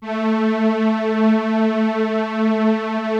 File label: Prophet 5 A4; Hollywood Edge - Giorgio Moroder Rare Synthesizer Collection